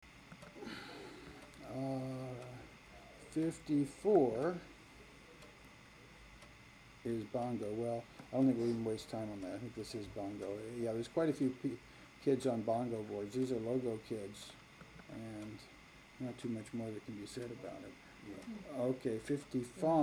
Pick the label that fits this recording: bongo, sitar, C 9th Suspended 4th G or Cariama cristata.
bongo